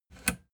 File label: plastic button latch push enable